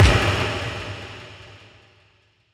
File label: Impact